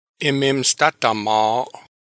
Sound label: laugh fart